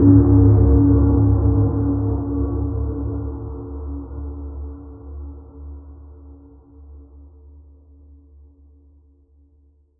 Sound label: soft hits of a, gong